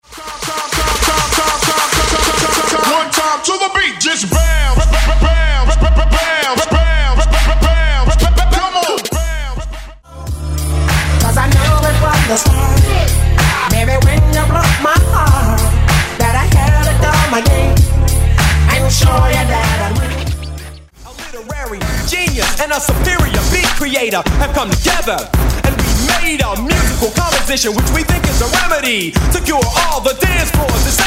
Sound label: Throwback Oldschool HipHop RnB Megamix